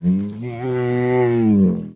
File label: whimper